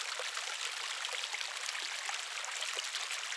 water

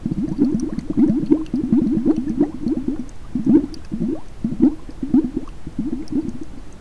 underwater

